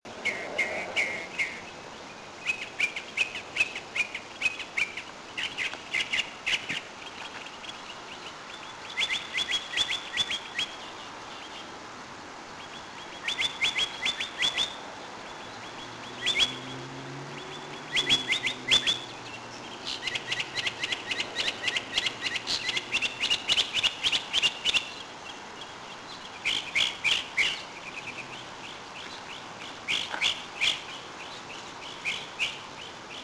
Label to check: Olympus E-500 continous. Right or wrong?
Wrong